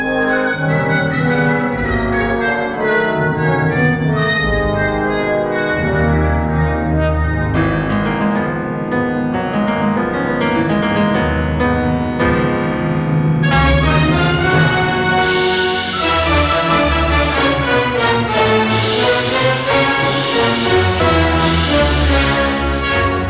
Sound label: classical